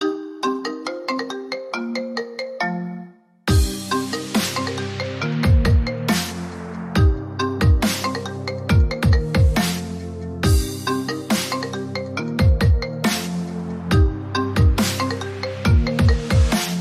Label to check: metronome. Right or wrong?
Right